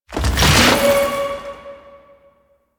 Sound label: Protective Shell Charge; Warframe; Citrine